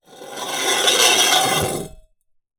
Drag, Vase

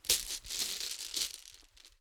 Marbles Rattle

